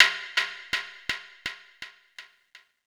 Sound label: Echo Taiko; Sonic Foundry (Sony Creative Software) - Futurist Drum & Bass; Drums & Percussion